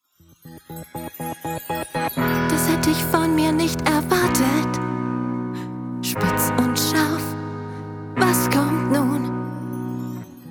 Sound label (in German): Gesangsdemo Animation, German; Gesangs-Demo - Trickfilm; Vocals (Gesang), Trick, Lip-Sync (Synchron); voice